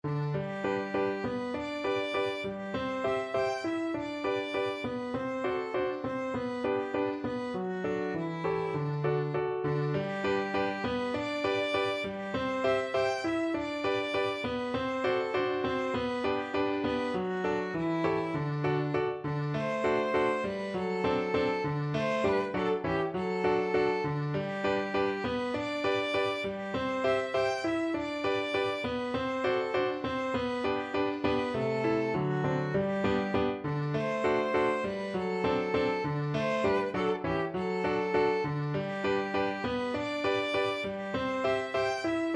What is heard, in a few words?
schumann op69 10 violin